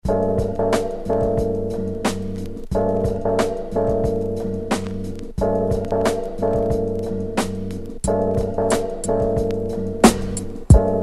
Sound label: Instrumental